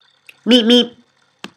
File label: meep meep